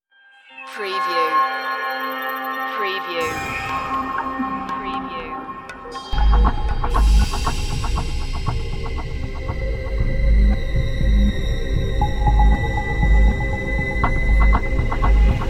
underworld series dramatic cue

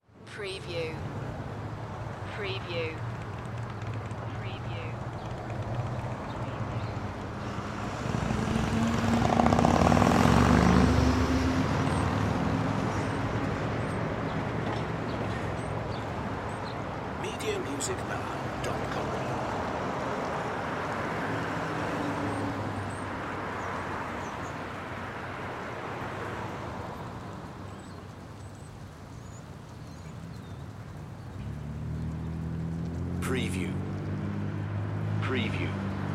City Sidewalk; sound effects bible

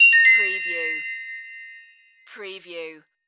Multimedia Chime

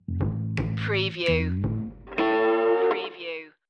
fixin to score stinger

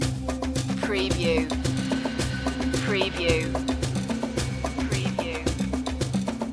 african fields loop

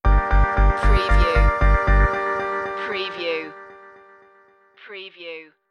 purple dream stinger

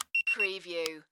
Pager Test Three Beeps

